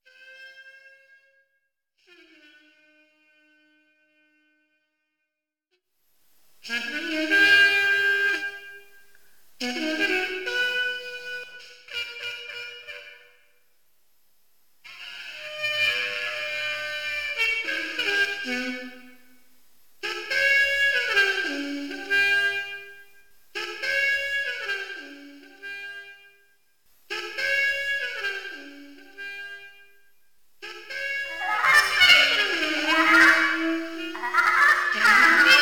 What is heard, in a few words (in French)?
sirene seule trompette